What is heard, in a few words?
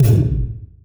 kick